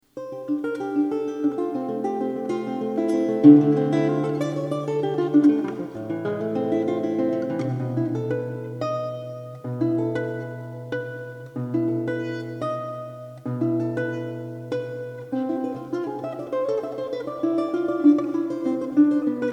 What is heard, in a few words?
e minor guitar